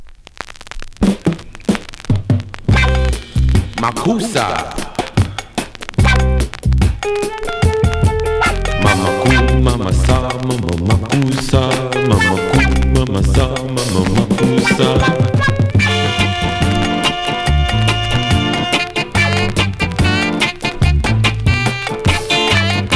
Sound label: REGGAE